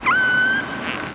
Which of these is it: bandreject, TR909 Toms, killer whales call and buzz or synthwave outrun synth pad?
killer whales call and buzz